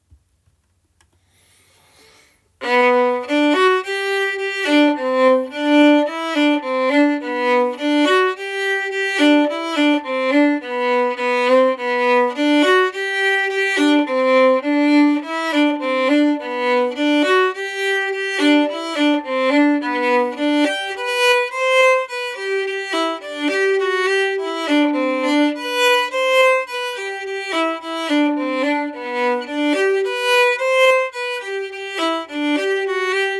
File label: blackwater polka harmony, fiddle